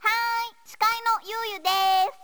Coin Inserted; Yuuyu no Quiz de Go Go; taito f2 system arcade

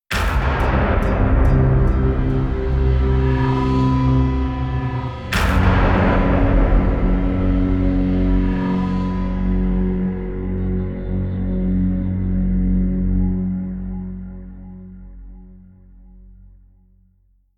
Mission Music Mystery Stinger; starcraft 2 wings of liberty

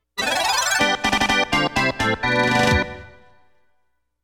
Fanfare; Konami Music Masterpiece Collection

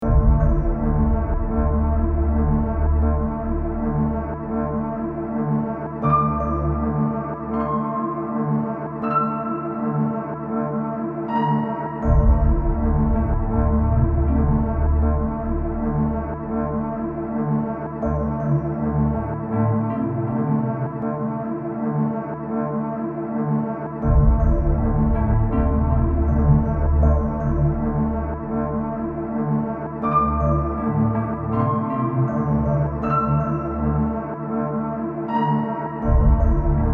Eldritch Spooky; Floogen

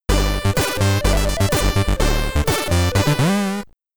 Get Ready!, SID Stereo, commodore 64; snowman

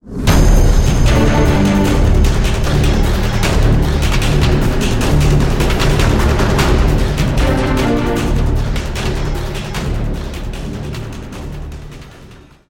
spawn

